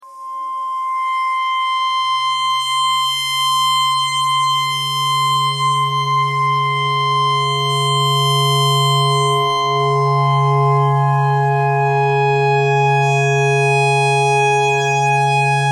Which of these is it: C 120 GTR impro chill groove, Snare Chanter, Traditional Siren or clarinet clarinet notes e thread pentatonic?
clarinet clarinet notes e thread pentatonic